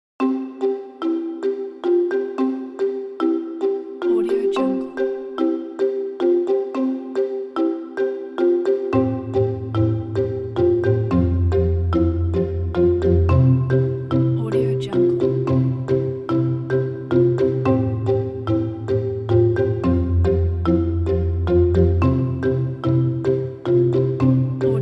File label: corporate and positive marimba background music